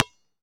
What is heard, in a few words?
Street Ball Bounce Blacktop, Street Basketball; SFX Kit